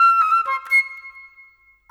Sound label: Flute; Sonic Foundry (Sony Creative Software); Orchestral VOL-2; Modern 27